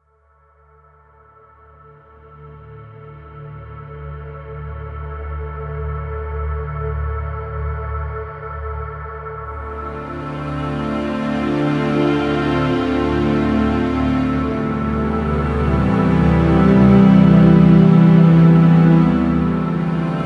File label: open chords